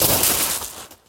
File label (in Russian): shoroh rassipannih melkih kamushkov